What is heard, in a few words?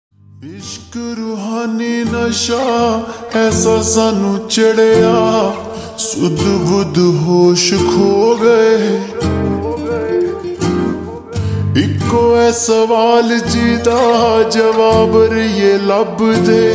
Slowed Reverb Ringtone